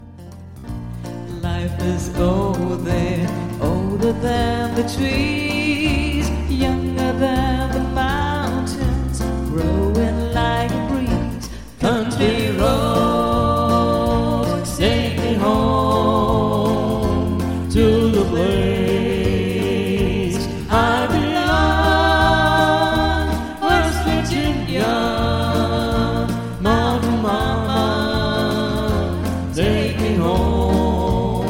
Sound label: Country Medley